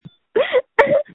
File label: screams